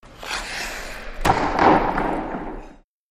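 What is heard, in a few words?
Hockey Ice Scrape Puck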